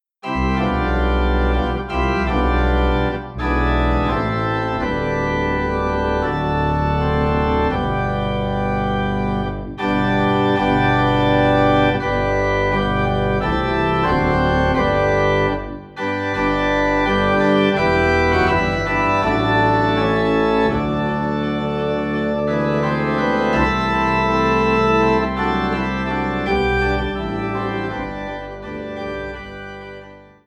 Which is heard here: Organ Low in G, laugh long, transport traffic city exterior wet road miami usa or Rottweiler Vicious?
Organ Low in G